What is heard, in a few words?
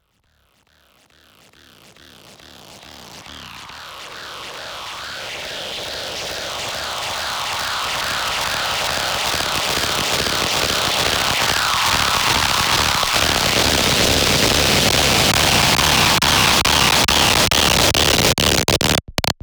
Distortion Riser